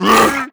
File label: attack pre, zombie vox; creatures